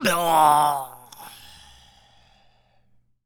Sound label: Male Death, Zombies; zps; Male1